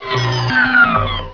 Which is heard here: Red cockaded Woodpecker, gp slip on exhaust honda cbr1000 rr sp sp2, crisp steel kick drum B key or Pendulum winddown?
Pendulum winddown